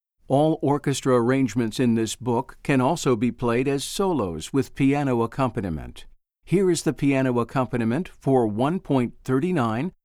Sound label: Piano Accompaniment